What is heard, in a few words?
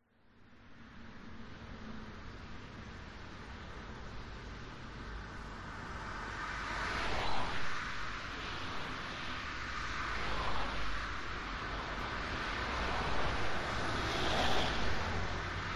vehicles traffic main road town pass by bursts